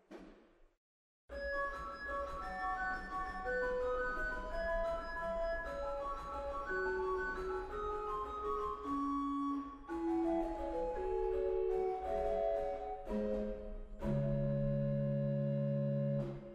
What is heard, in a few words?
Roehr Floeta 4, organ; waltershausen